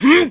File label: Wind Up; Ganondorf